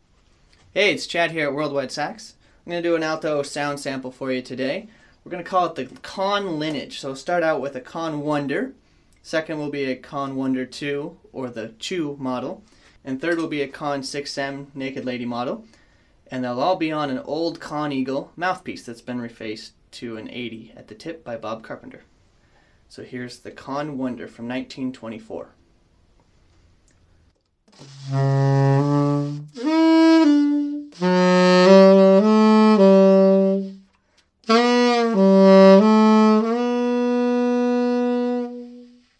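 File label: Alto Conn Set Wonder Chu 6M, alto saxophone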